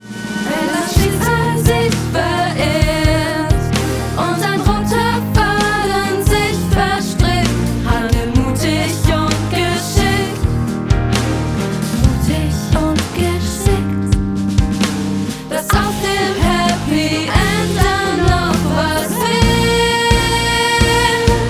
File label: Musical Children